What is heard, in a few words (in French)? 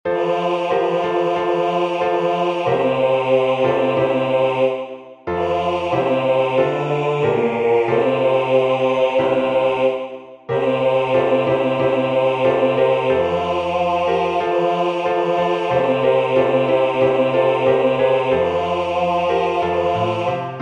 Due pupille amabili (baryton); Nocturnes; Concert du 13 décembre 2024 en l'Église St Pierre - St Paul